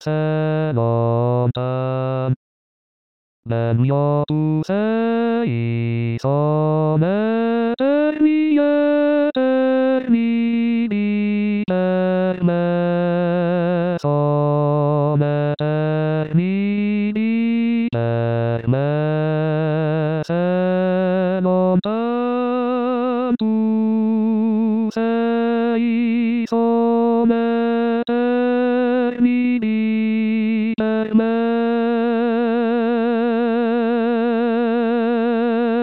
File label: hombre; MOZART; Nocturne 2 "Se lontan ben mio"; KV 438